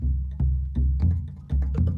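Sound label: Calm Far, music; From Dust; ps3; xbox 360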